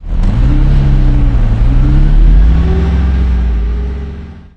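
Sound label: centurion engine interior load, tanks; engines; War Thunder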